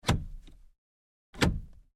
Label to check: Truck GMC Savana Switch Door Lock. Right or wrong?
Right